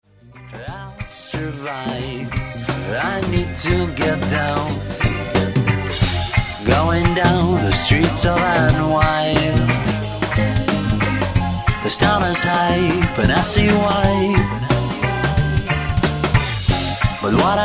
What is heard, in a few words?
reggae